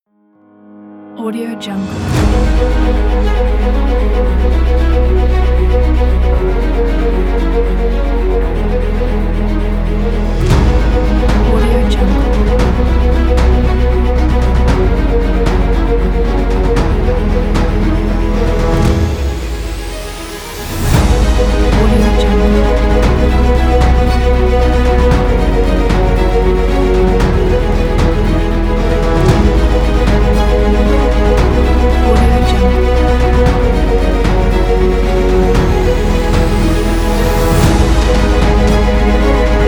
motivational cinematic trailer adventure

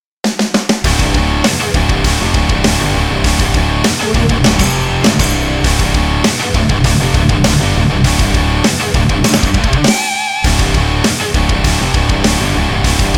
hard rock

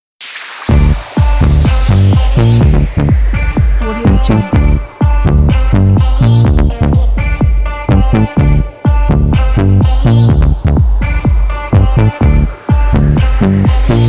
fashion electronica

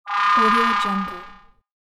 futuristic cyber weapon

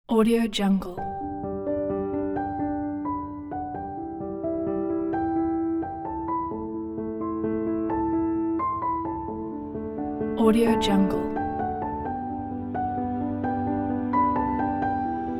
inspiring emotional travel

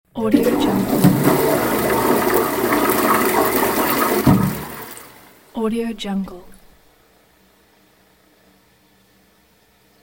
toilet flush and water refilling

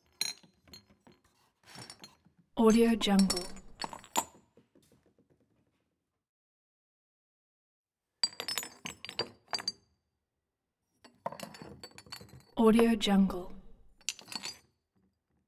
glass vials movement; collanderson

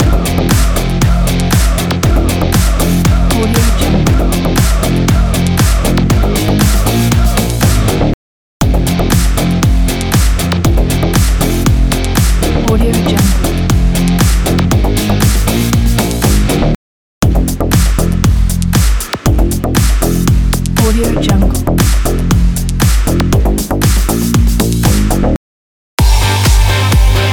heavy sport loop pack; studiowx

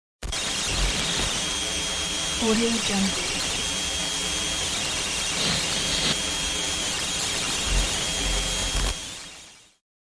tv noise

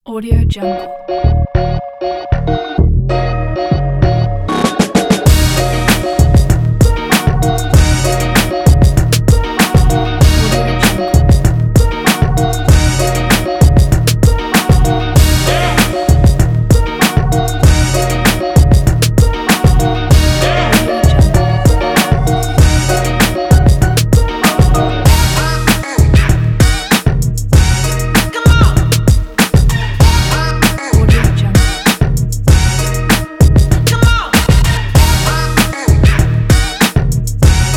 hip hop

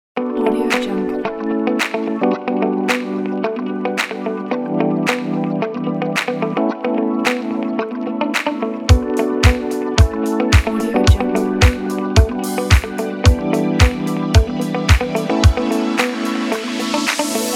disco

